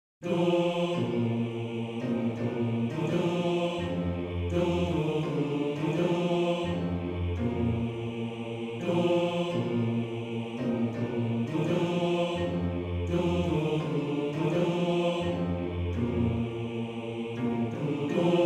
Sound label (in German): Bass; Bass 2